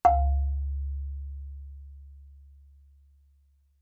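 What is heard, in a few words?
Marimba yarn fortissimo Db4; MIS Pitches - 2014; Percussion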